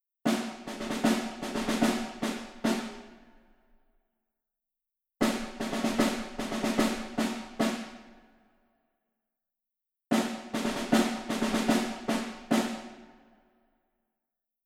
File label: Rhythm; Ravel's Boléro